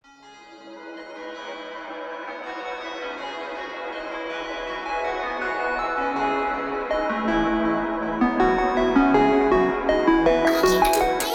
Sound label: heavy metal; pop